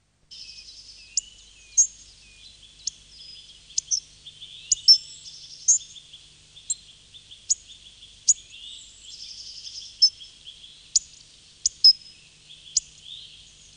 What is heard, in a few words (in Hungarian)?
Meggyvбgу; Madarak